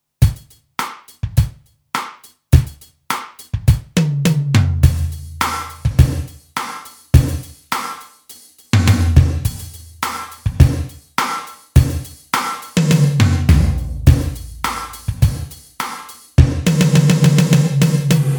Alesis Midiverb 2 Slow Gate 100 400 ms

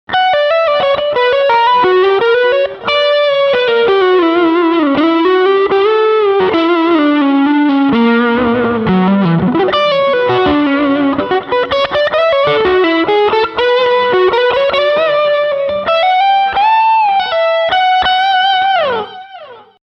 Leadline Delay

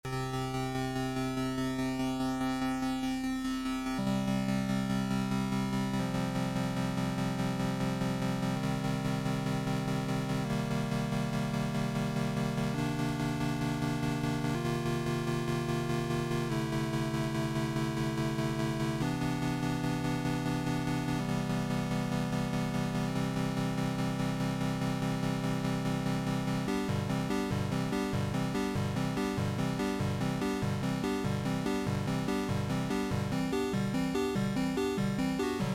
MicroFreak Cords

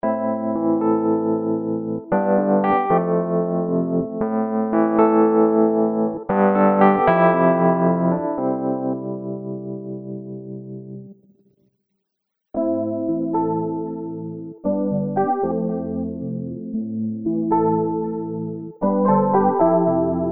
Electric

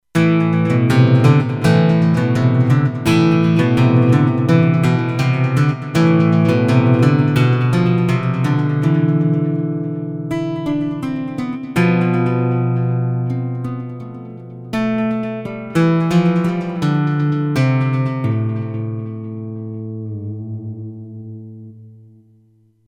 delay Guitar

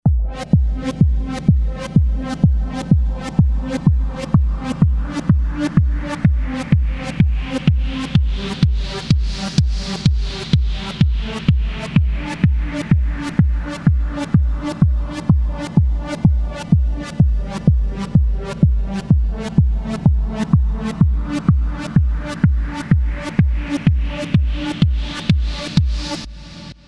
Beat

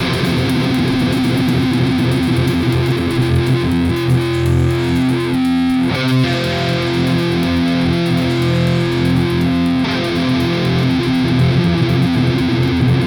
Wampler Terraform Chorus

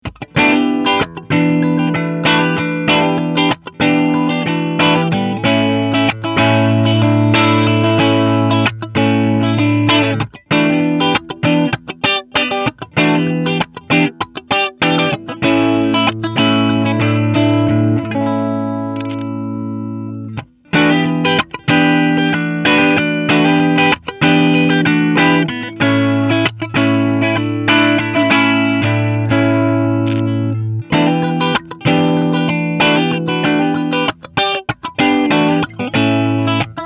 Pentode Clean Dirty Switch

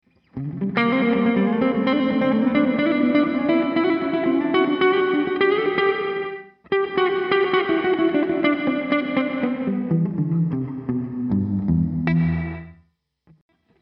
Gated

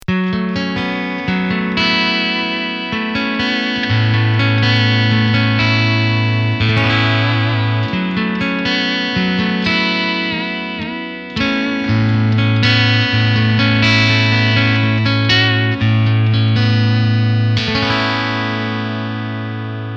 Piezo

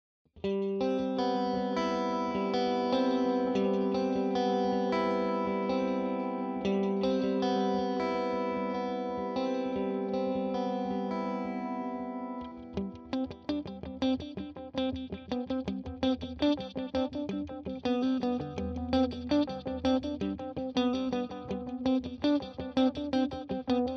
Multi Pass

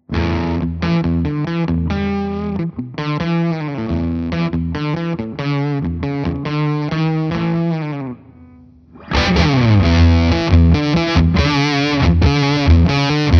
Orange Mode Fuzz; Stone Deaf Rise n Shine

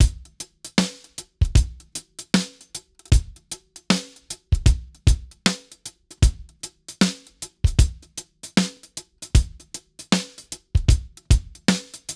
Drums Waves VEQ4; UAD-2

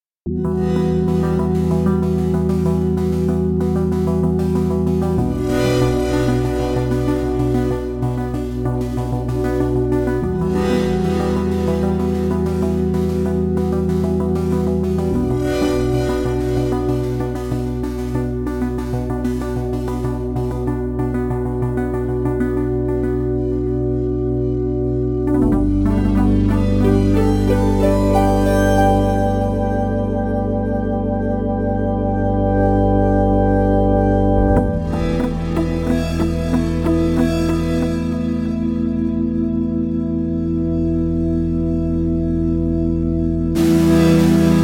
Dynamic Pad

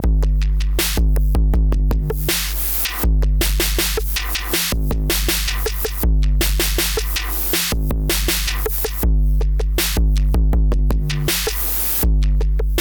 Oversampled TR808 Scratchoid Extrem; Pancz

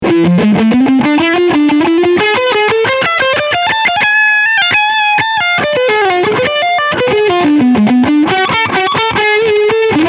High Gain

